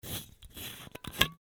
bottle open

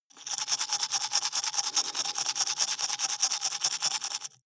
teeth brush dry open inward

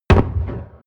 shotgun distant shot

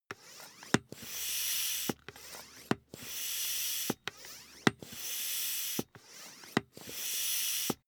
air pump ball sequence

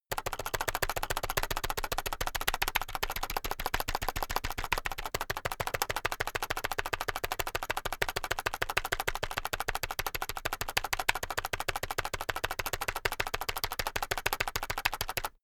keyboard external backspace sequence fast heavy

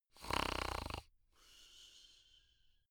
fast chattering snarl

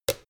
plastic hit

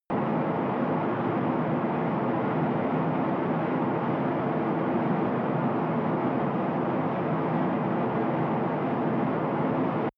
military jet fly distant rpg

